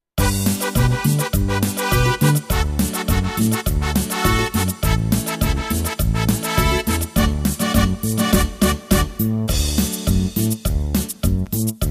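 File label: Cumbia, Latin America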